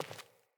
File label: Sandals Walk Default; Player; Footsteps